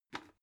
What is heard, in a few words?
bucket empty handle grab